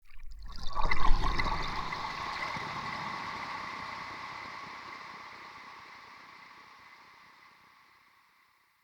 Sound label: trippy lap, ambience; Soul Plane